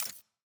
edit click; champ select

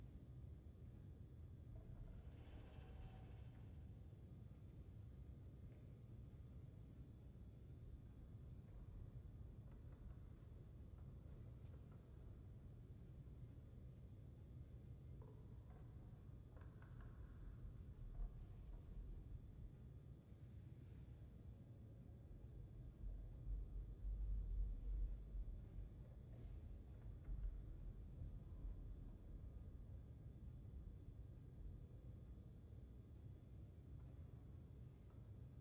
amb record

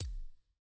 timer tick small

